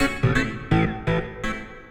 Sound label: synth house 125 A; eJay; Keys